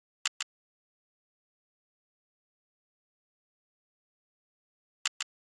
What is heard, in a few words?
Drum Loop 100 BPM Percussion, Hip Hop; Drum Loops; Loop Stems; Cymatics; No Se Nada